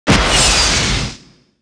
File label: miss; BigMax nº 14 Shattered Steel